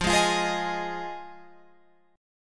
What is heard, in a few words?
F Minor 7th F Guitar Open F; strummed